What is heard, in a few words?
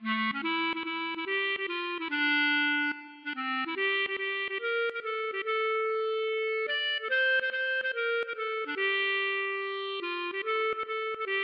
klezmer